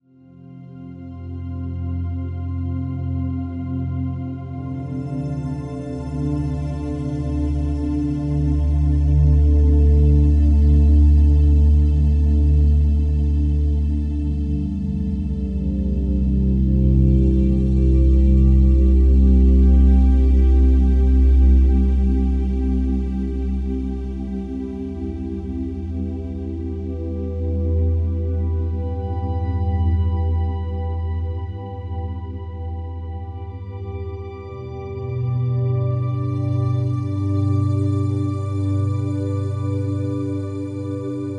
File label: Relaxing Music